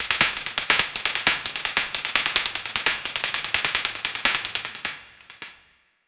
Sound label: latex suit